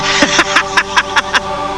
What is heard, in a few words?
laugh; vegetas laugh